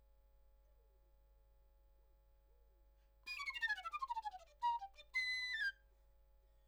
Flute

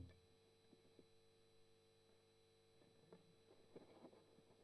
Strum Octav

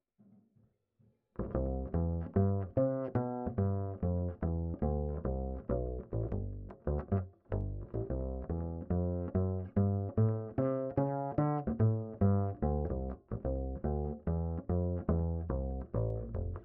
Bass Line

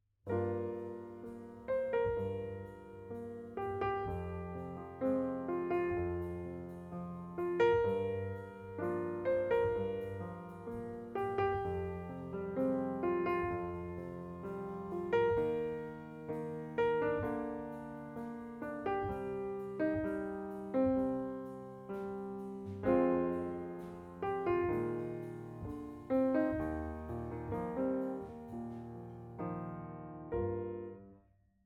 Piano B

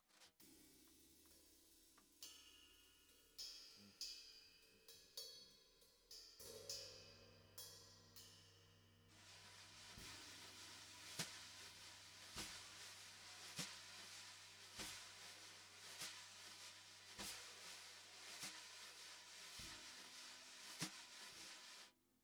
SNR

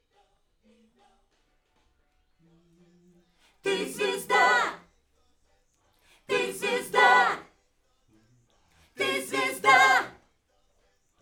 Backs AKG

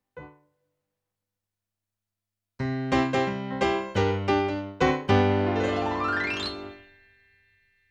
PIANO

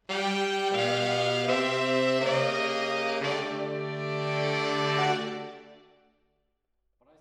MKH AB, piano

